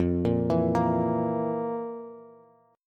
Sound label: F Major 7th Suspended 4th F, Bass; FM7sus4 arpeggiated; JGuitar